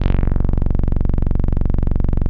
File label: 505, String Machines